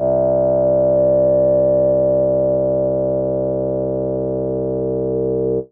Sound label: BASS SWEEP, DRONES & PADS; 90's Sample CDs